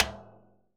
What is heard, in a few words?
Bombo Stick Rimshot; Best Service; Festa Latina VOL-1; Single-Hits